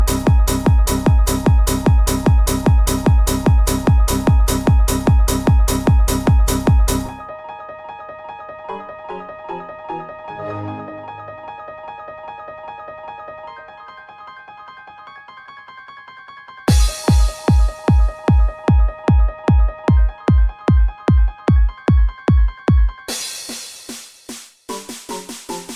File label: Techno Windows Remix